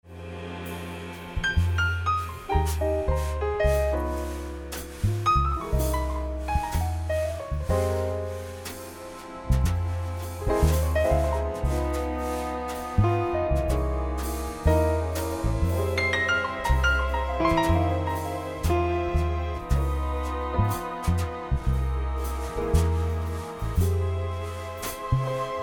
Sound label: Piano Solo Included Key Of F Minor